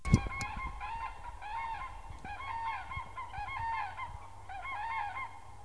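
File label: European Crane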